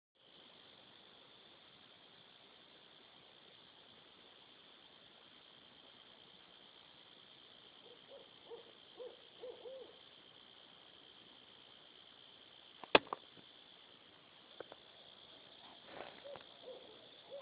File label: coyote